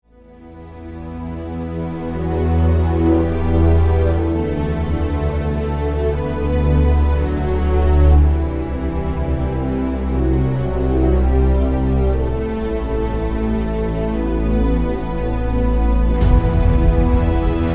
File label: Orchestra Trailer